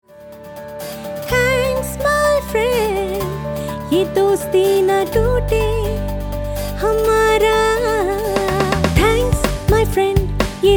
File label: THANK YOU HINDI FRIEND TO FRIEND FEMALE